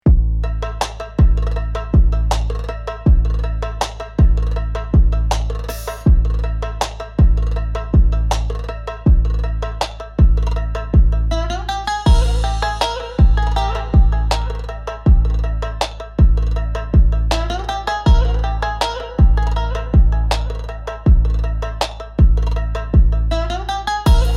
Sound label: Middle eastern beat dunes and drums